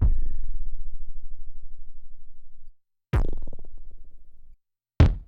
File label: kicks, Bass Drum